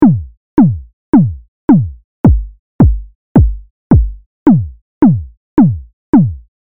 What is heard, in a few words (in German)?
Hex Drum Pitch Kurve A und B